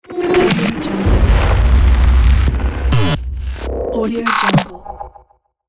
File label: glitch transition